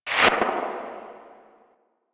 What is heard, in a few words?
UI Focus Enter Tree; DE; Warframe